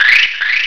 Laby Ramp; Loony Labyrinth 1.02 Demo

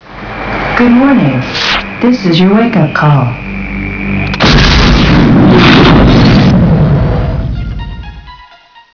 wake up call; Johnny Mnemonic Interactive

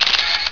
camera

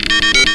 illegal move; Deco 1.0

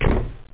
thump; amigamud

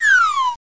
Slide Dn

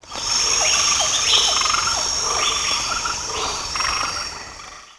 Rain Forest Exclaimation; 250 Desktop Themes

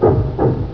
CHUG PUF

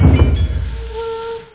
DRIP; Epic Interactive Encyclopedia 1998 Edition

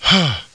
ungh; duke nukem total meltdown

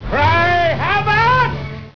cry

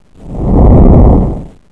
Flyby; HAVOC; RB Sounds

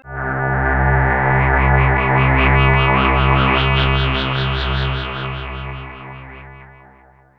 soft, Synth

